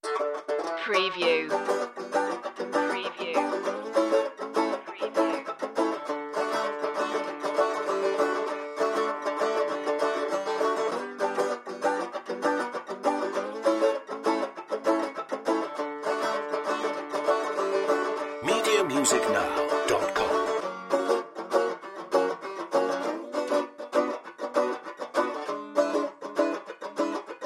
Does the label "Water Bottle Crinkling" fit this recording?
No